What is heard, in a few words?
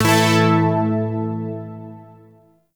Shawzin Chromatic Chord; Zariman Shawzin